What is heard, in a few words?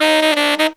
160 BPM, Horns; 90 s Sample CDs; Zero-G; Phantom Horns